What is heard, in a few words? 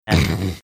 snorting